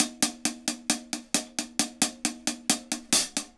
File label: HAT, HI HAT LOOPS, Dub; Drumdrops In Dub VOL-1